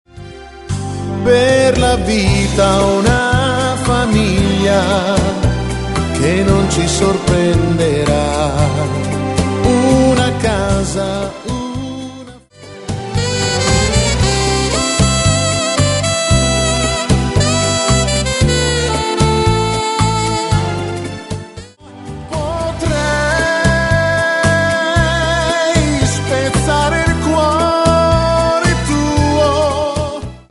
Mix Beguine

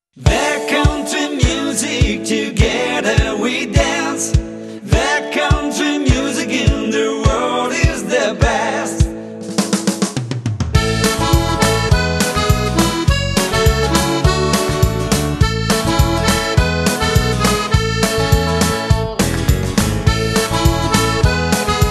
Country music

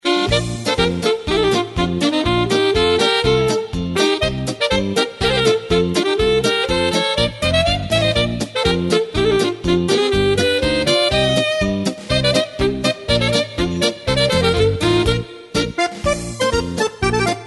polka

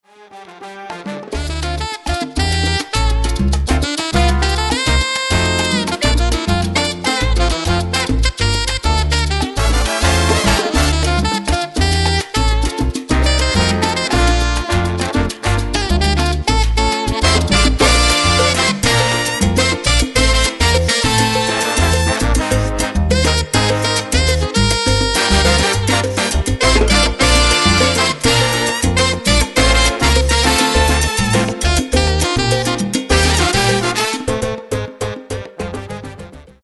Sax Rhapsody

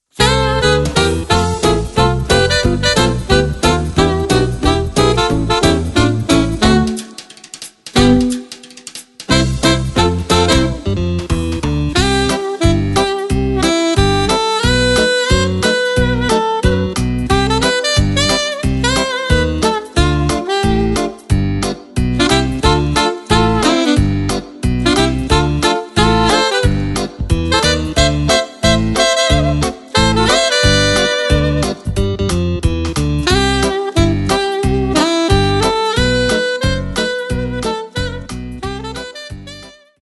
Fox trot

